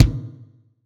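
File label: acoustic bass drum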